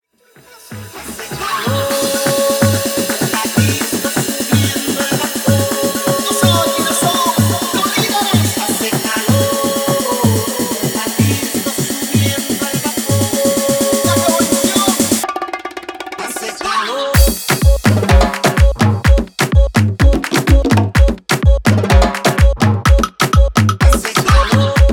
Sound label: afro remix 126 bpm